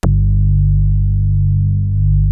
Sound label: Prophet 5, G2; Hollywood Edge - Giorgio Moroder Rare Synthesizer Collection